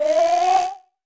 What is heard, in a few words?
fly Far; HeavenStudio; chameleon